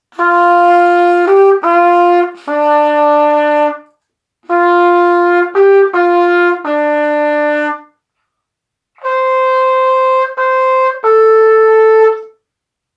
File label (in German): Stille Nacht Trompete; Schulleben; Advent